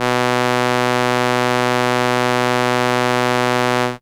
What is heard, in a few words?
Viola B2, ARP Solina Unmodulated; musicradar-synth-samples